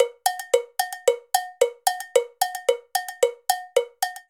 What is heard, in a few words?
Bomba Cowbell-223bpm; Latin Percussion; Ableton; Factory Packs